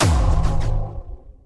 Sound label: surface prop froze hunter